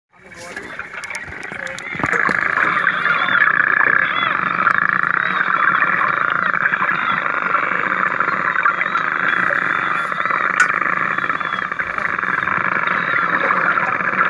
common dolphins Hydro

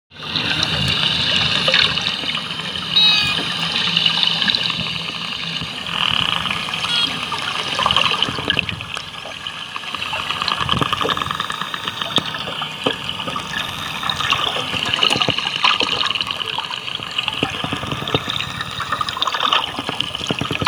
Risso

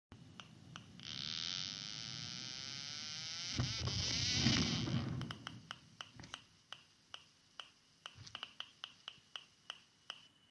Blainville click buzz

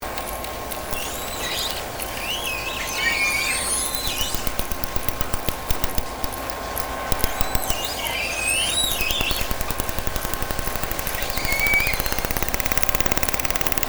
pilot whale

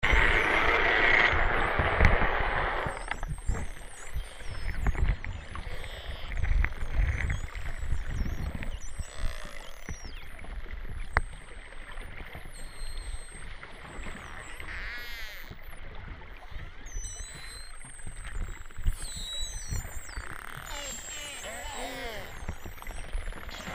Common dolphins

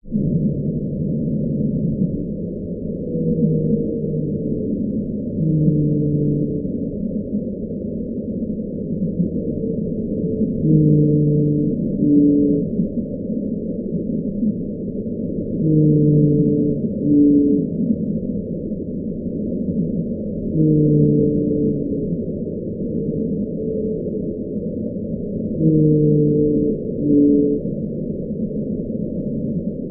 Blue Whale Song

